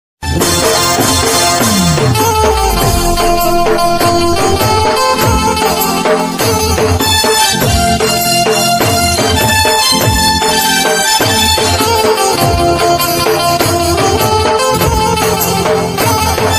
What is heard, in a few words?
new gujarati ringtone